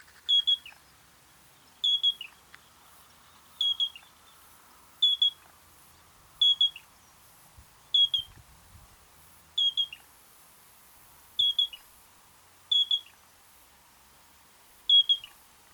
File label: Yellow breasted Crake Porzana flaviventer